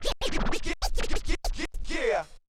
OLDSCHOOL SCRATCH 96

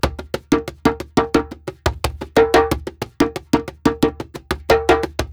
ETHNO PERCUSSION 90; ethno2

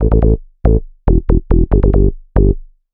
ELECTRO BASS 140 A

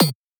perc; Anthemic.Electro; drum hits

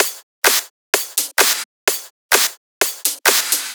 tops 128, loops; Anthemic Electro

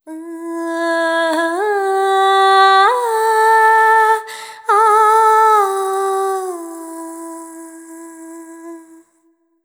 ETHNO FEMALE, Vocals; ethno2

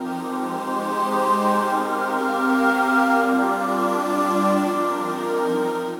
160 Fm Pads; Touch Loops; DigitalSpectrumsMini

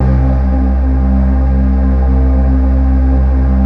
PAD

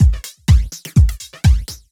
DEEPHOUSE BEATS 125

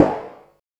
SNARE, INDUSTRIAL

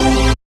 dance chord

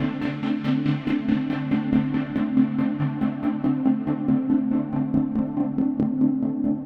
techno pad 140; Techno2; Keys

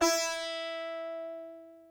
ethno, 125, A

